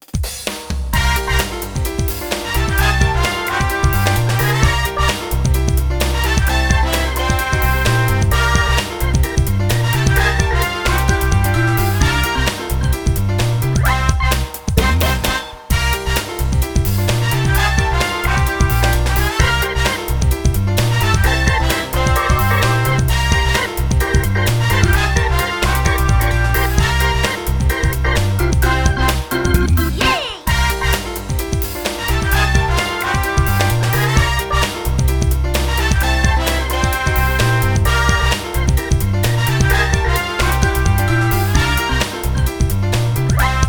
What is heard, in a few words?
Tennis - Menu; Super Monkey Ball 2